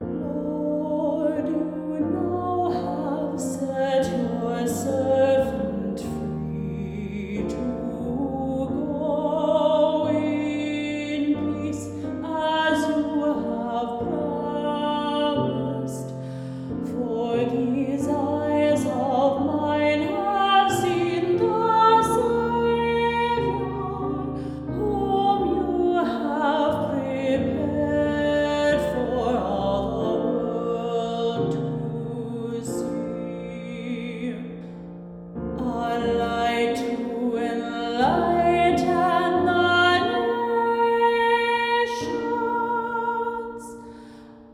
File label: Nunc Dimittis Solo